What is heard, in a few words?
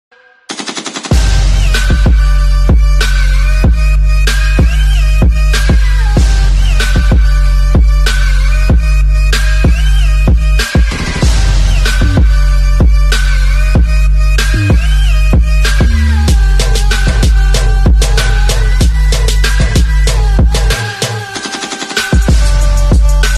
Mobile Ringtone